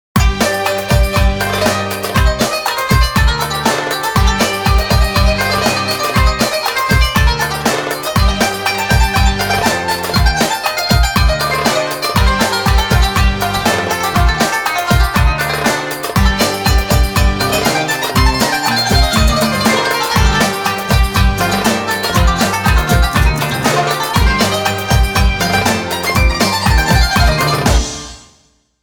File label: Sharqi, Middle East ringtones; Nokia